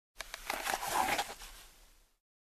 Pulling out gun slowly

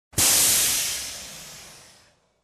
Oxygen Tank Hiss

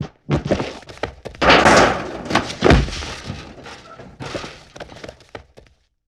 Fight Sequence with scattered debris

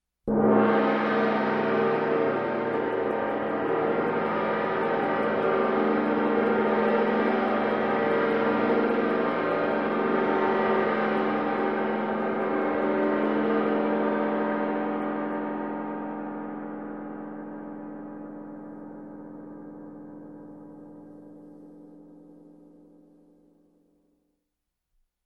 Medium Gong Sustain

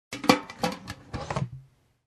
Empty aluminum can cover

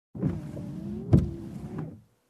Car windshield wipers once

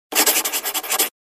Doodling on Notepad

